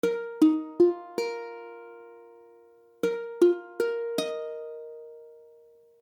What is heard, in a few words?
Ebsus2, ukulele; ukulele akordy